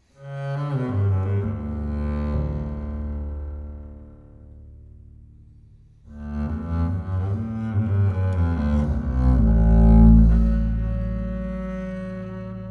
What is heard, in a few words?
double bass prelude; six vignettes for double bass; 1. prelude